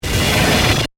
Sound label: Hit Digital Warble; Hit Sound Effects; BlastWave